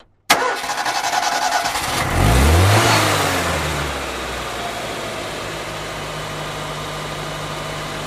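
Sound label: Jeep Cherokee Start Engine; Jeep Cherokee 1984 Start Engine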